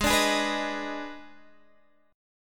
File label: D sharp Major 7th Suspended 4th Sharp 5th G sharp, chord